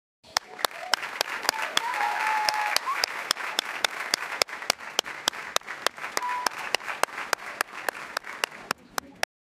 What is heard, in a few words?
clap sounds from a crowd; event